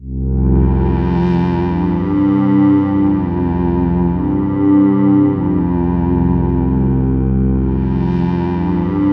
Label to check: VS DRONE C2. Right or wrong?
Right